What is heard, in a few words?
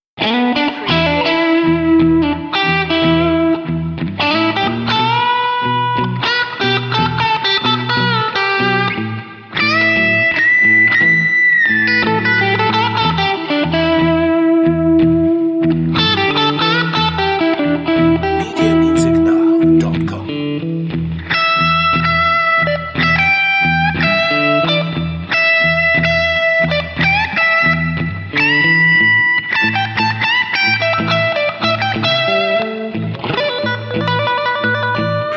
slow blues